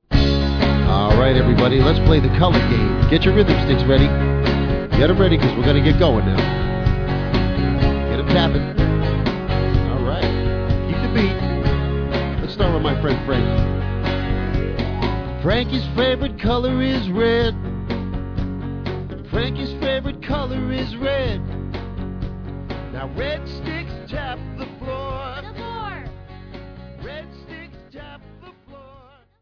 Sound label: Color Tap Song; colorful rhythm sticks to tap, tap, tap